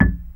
GAMBANG G#6; Spectrasonics; Heart of Asia

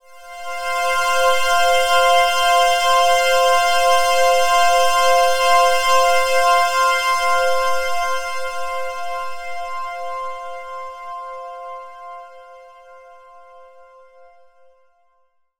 Pad; neuro; Stanza

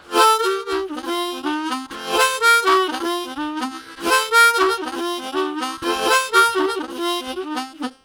Progressed, Harmonica Riffs; Zero G

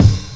TOM, TOMS KIT, Ragga & Dub Reggae

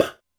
Puh, Drum Machines Alesis D4

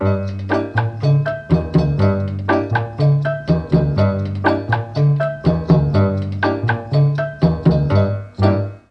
F#2 CHA FLT, Mellotron; Mike Pinder - Mellotron Archive CD

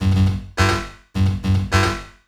Beat, Drums, Eclectic Electronica

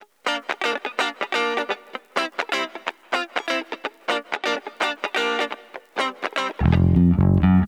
disco backing; Best Service ProSamples vol.48 - Disco Fever; AKAI

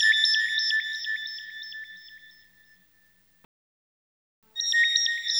Hip Hop and R&B Drumloops; Best Service ProSamples vol.01; AKAI

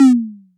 Toms, Elektron MachineDrum SPS1 MKII

